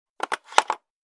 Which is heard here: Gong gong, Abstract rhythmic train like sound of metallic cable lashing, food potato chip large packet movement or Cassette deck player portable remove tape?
Cassette deck player portable remove tape